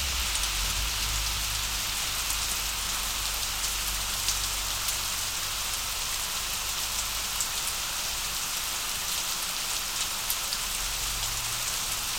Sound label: rain; de rain